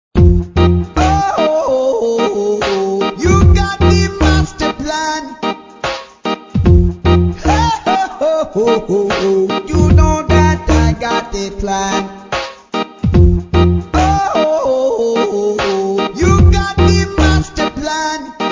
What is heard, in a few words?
Reggae Land